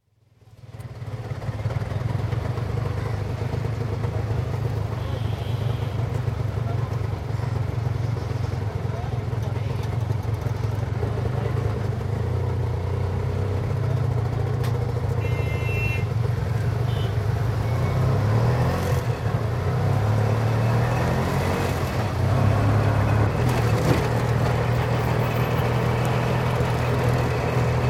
Delhi: Auto-rickshaw, interior, starts, constant run, stops India; City; BBC